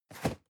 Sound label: cardboard box medium place slide; objekte